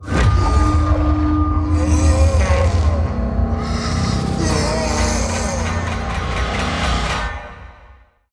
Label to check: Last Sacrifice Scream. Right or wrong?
Right